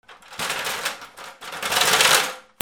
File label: GARAGE